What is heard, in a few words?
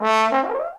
TROM DOIKS